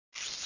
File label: Menu Comand; Windows; Whistler